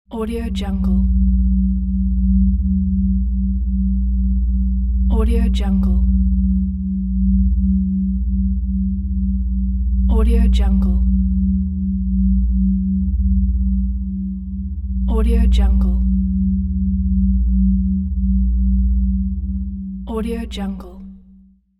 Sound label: scanner drone